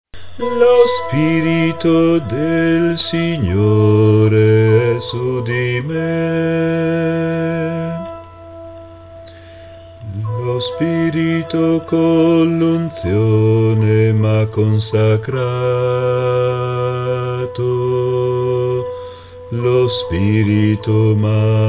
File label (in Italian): bassi